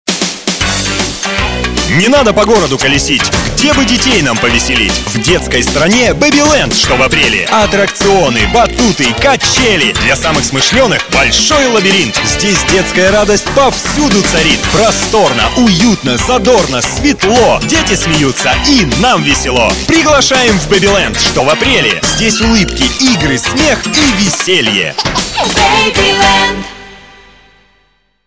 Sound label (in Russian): Smeh; Baby Land; RUS